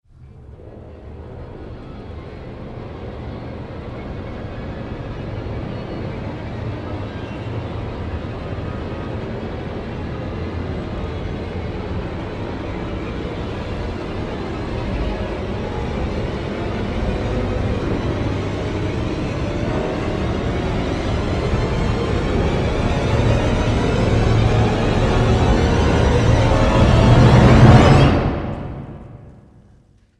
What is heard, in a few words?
Orch Rise